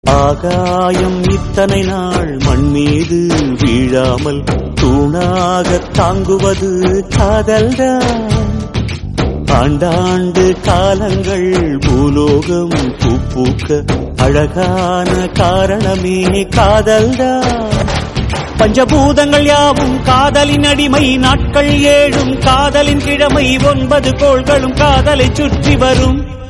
tamil song

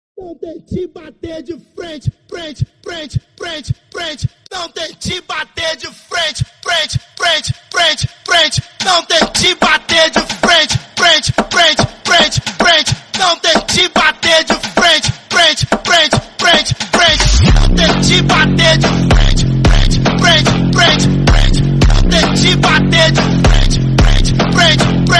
slowed reverb bgm

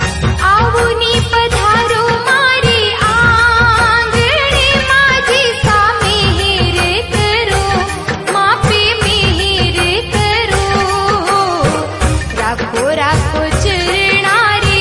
bhajan

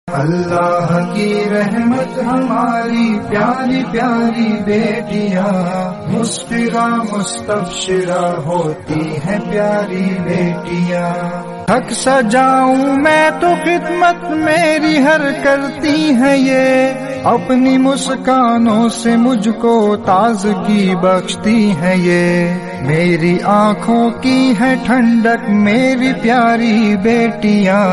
female version, islamic

